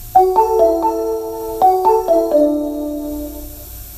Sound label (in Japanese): 上り接近音, osaka subway